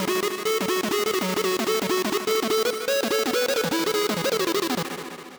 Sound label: sub lead bg